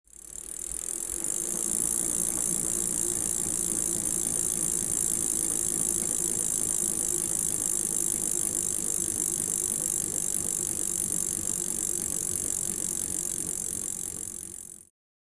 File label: Bicycle wheel spinning close perspective